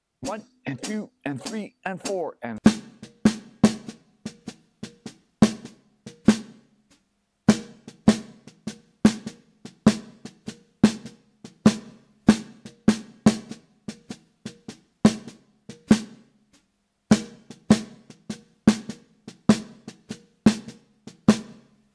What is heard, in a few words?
Melody Swung 8ths